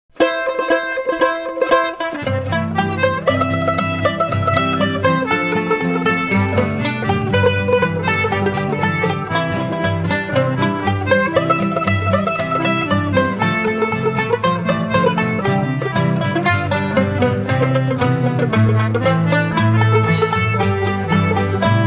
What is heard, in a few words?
deshutes, or lose it-stone's rag (instrumental)